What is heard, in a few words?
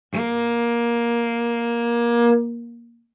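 Cello arco fortissimo sul D Bb4; MIS Pitches - 2014; Strings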